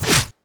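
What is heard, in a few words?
bullet left to right, nearmiss; arccw go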